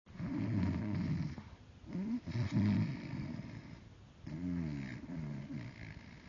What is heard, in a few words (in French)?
texture ronflement